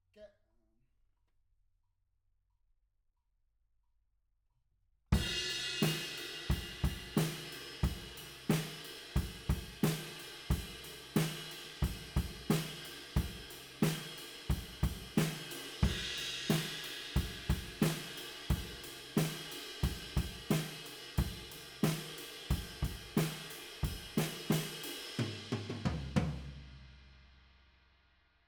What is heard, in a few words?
ROOM